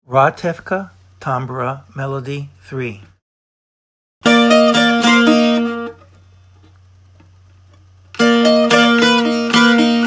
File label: tambura; Ratevka